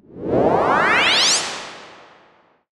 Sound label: portals teleport; Mineclonia